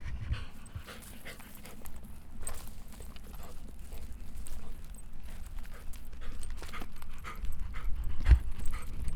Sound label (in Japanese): 柴犬と散歩